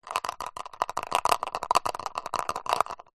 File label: money coin single shake in plastic small container; foley